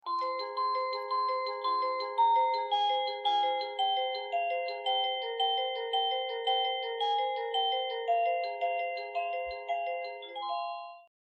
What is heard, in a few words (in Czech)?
melodie